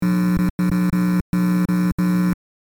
Electrical buzzing burst speaker glitch long; sound design

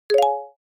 Alert notification

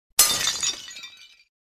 Small window breaking with debris

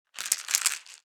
Pill container shaking pills inside; medicine

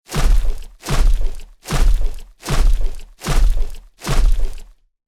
Robot assault unit footsteps walking

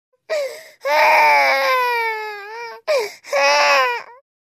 Baby crying hoarse breathing

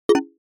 Error notification alert

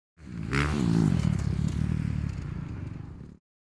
Motocross revving engine short close perspective

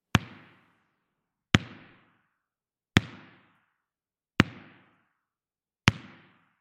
Tennis ball hits the ground indoor court

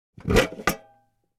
Metal trash can lid opening

